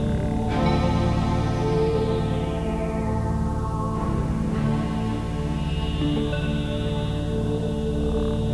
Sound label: song